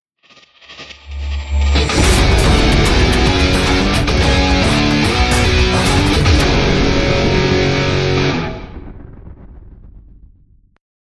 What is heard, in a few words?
audio background for logo animation video